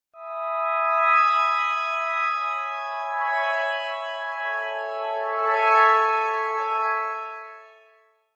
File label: Pads, ringtones; HTC; Magic